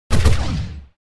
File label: gun